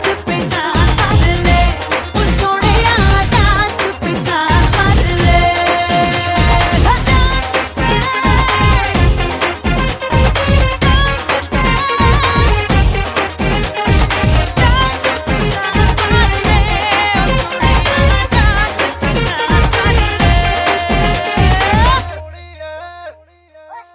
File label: Hindi Song